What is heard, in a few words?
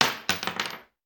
Bullet Shell; Sound Ideas; Guns